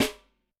JNGL SN, Snare; Yamaha DTXpress-II

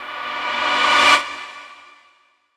DANCE FX

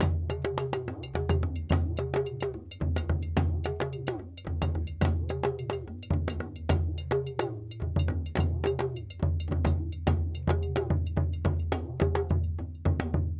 Djoun, World Percussion

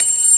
B3 HI SLOW; E-MU Formula 4000 Series Vol. 5; Protozoa; Vintage Presets

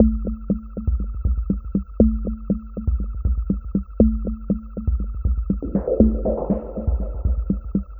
Percussive

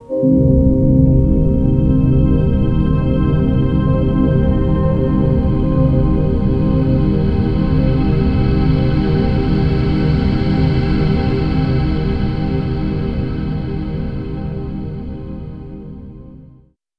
Hi Mid Complex, Complex Wave; Sonic Foundry; Sony Creative Software; James Johnson Spektral Minimalism

